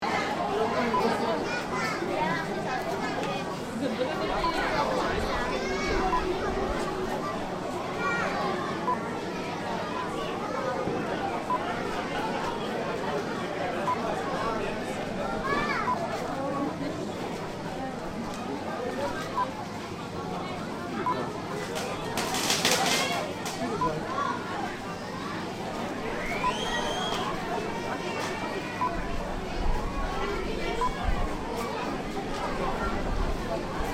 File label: Indonesian Street Parade